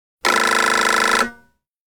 Reed, Nokia 6110 Navigator

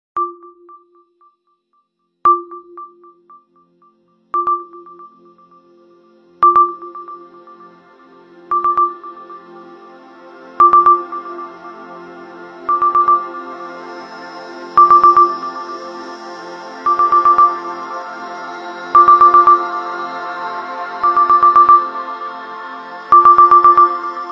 Clock alert, Nokia 5530 XpressMusic

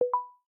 Silent mode off; Samsung Galaxy Watch

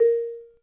timer, phone tones; LG GD570 dLite

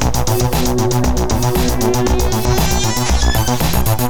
Electro; ringtones; Miami Twice; Google; NewWave Labs

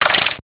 Shutter

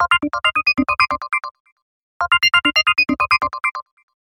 ringtones; HTC; One-M7

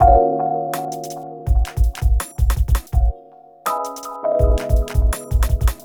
Rnb; Google; NewWave Labs

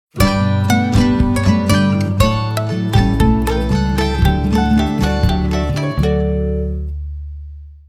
Brightness, Ringtones; Nokia; Asha 201

